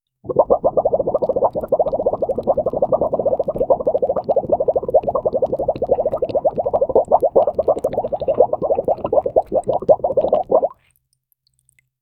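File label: a soft bubbling or gurgling, medical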